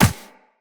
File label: Walk Ice, Footsteps; Operator